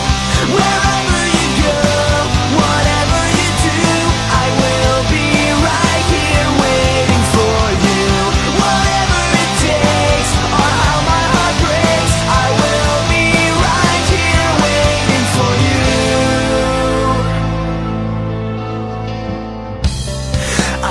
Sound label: rock cover, ringtone